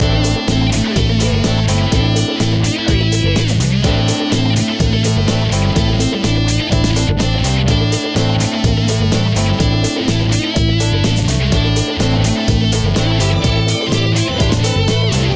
disco funk loop

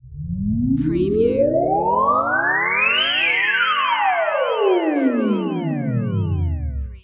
Space Scifi Sound - beamer

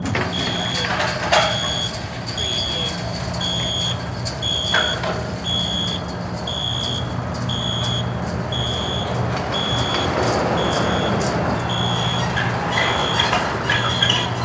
Police Jail Gate Open

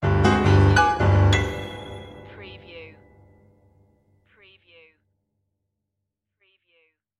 Piano horror stab; beanstalk audio

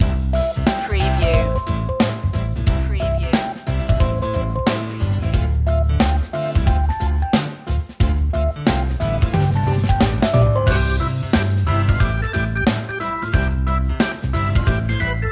walk happy loop

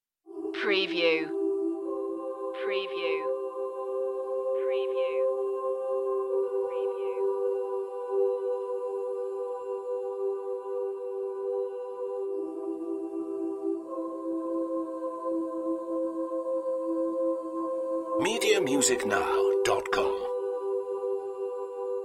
flowing emotions no piano bass or drums

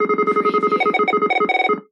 Readout Beeps Scrambled

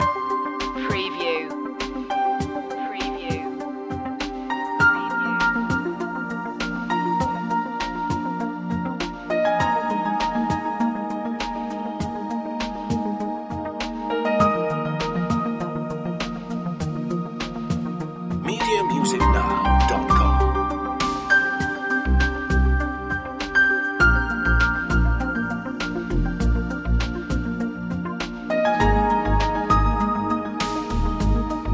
ad style loop

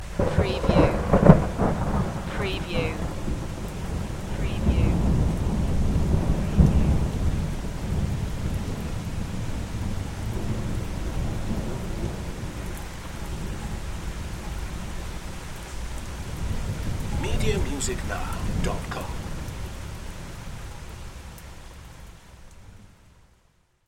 Thunder Clap

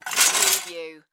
Sword Drag Movement

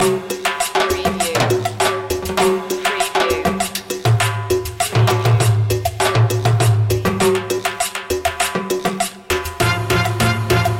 colombia cumbia loop

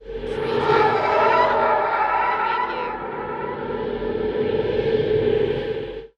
Ghost Shriek Whoosh

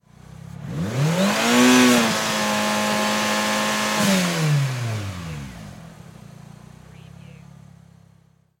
MITSUBISHI ECLIPSE ENGINE LONG REV

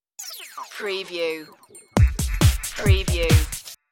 smash house stinger

